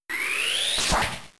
Cross Chop; Pokemon; Gen 3; attack moves; RSE

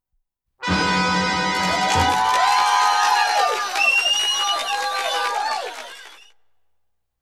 Stage Clear Jingle; tokimeki memorial taisen tokkaedama poitter s point

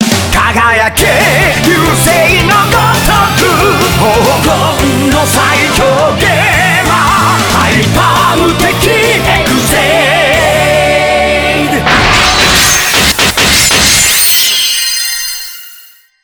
Change Music; Kamen Rider Climax Fighters; ps4

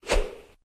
New Wave

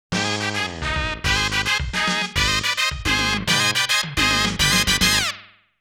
START FANFARE; STUNT TRAX

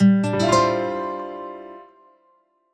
Jingle Bridge; Clubhouse Games: 51 Worldwide Classics

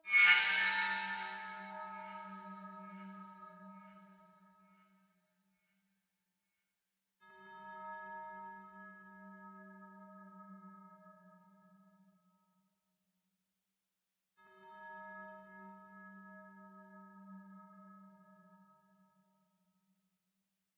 K.I.A Bells And Hits; sniper ghost warrior 3 gamerip 2017

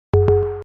training Progress; Game Dev Tycoon

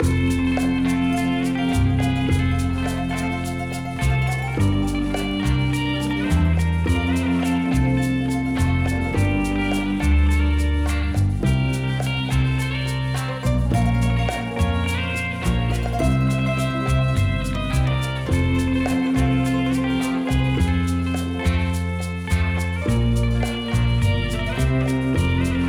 Instrumental; DavesCars

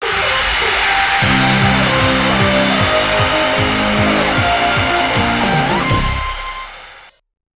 Goal; Super Sidekicks 2 The World Championship; Neo Geo arcade

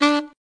D Sax

